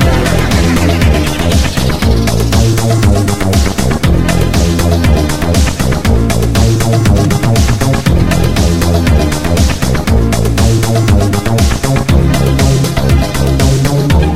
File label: rowdy; ionfury